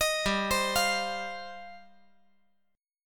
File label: G 7th Suspended 4th Sharp 5th